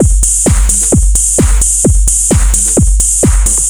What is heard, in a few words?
4 floor beat, trance; Sonic Foundry (Sony Creative Software); Trance NRG VOL-2; Drums; Complete 4 On The Floor